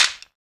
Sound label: Perc Clapstick; Drums; Misc Percussion